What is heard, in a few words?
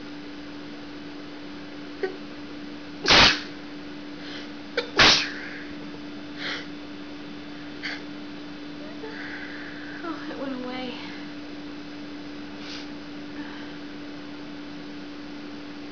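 sneezes, female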